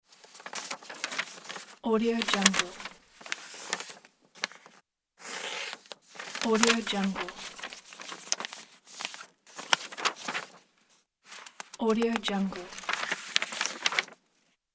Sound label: paper